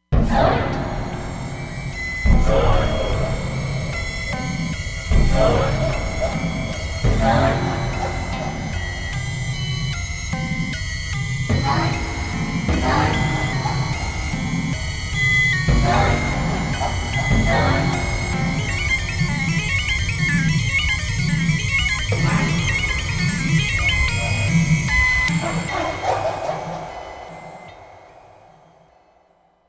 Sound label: Control Room, Roland Fantom XR